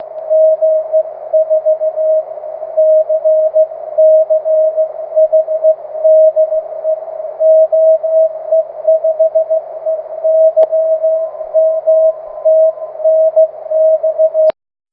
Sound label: cw; 23cm